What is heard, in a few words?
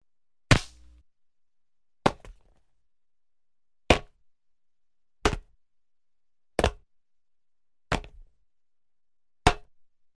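sounds of catching the ball in baseball, compilation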